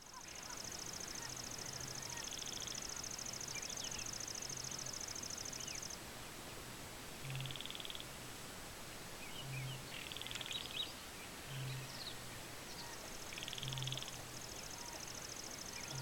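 P pusilla, background L lanceolata song L mongolicus calls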